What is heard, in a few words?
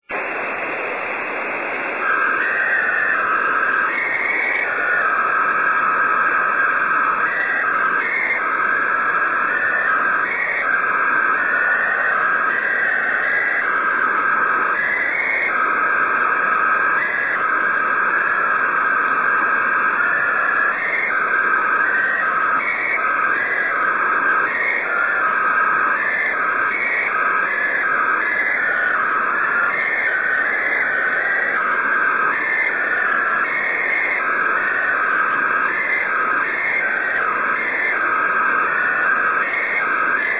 5G jt65c; WSJT JT65C; 横浜市; 茅ヶ崎市; ANT:45cm offset dish